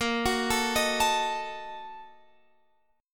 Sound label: Bb Major 7th Suspended 4th Sharp 5th, Guitar, Open F; arpeggiated